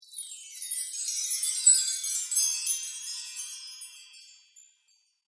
chimes

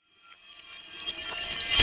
reverse glass smash

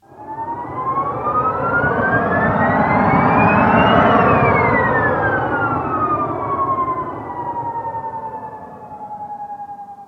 synth jet

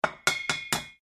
pan triplets 100bpm

